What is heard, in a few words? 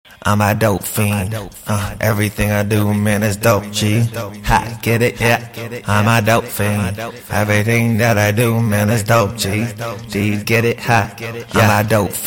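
trap hip hop 170 Ab major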